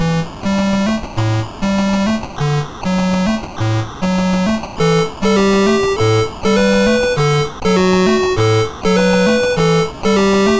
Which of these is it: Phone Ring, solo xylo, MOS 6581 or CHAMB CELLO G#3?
MOS 6581